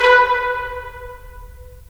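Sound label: brass trumpets staccato, right; Roland LA Composer vol. 4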